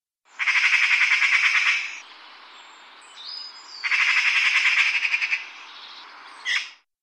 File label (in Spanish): urraca